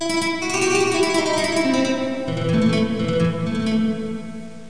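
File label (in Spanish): mandolina, efecto; Pipo-Aprende musica con Pipo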